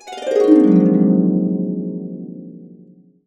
Harps

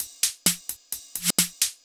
130 trance break, Drum & Perc Loops; Trance Explosion Vol1

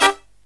low chord, brass riffs

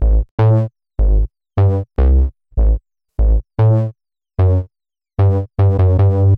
Classic Bass, Drum N Bass; Sonic Foundry (Sony Creative Software); Knutrix Drum & Bass

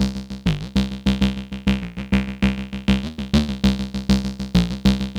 XPANDER; AMG - Vince Clarke Lucky Bastard

